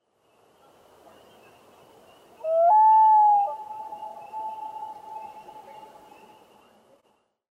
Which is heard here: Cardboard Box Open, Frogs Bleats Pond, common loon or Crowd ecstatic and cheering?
common loon